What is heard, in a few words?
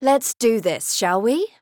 battle roar; dqxi; jade